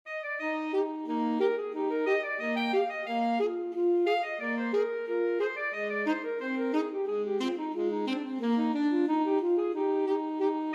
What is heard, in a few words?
alto saxophone duet